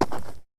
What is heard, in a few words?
dirt, footsteps